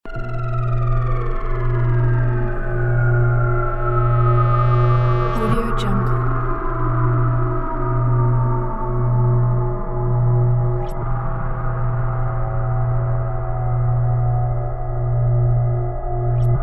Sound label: ambient suspense pack